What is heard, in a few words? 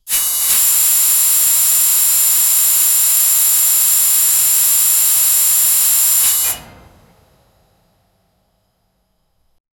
high pressure steam relea